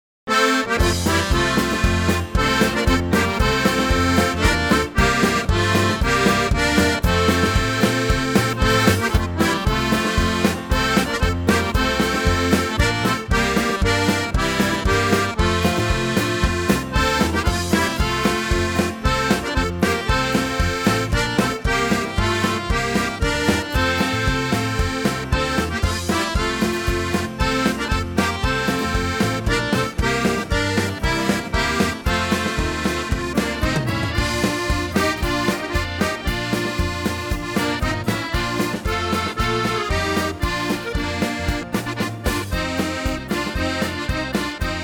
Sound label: Polka